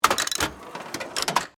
Steampunk Gear Wind, Scifi, Steampunk, Gear, Wind, Device, Clicks, Crank; Science, Fiction; Steampunk Sound Effects